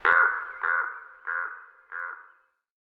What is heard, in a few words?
raven flyaway vox